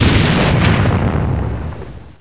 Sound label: exp sb